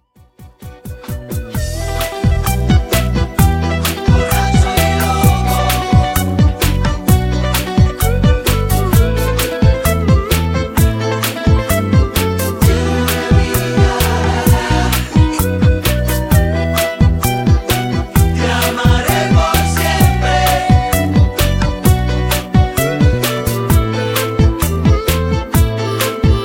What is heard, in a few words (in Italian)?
Bachata Ballo Di Gruppo Canto